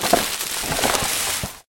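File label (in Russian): нетерпеливый шуршащий бумажный звук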